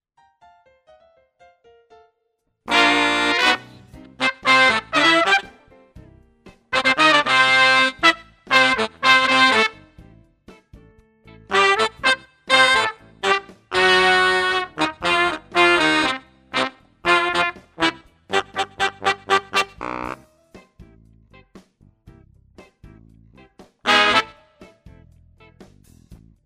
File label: horns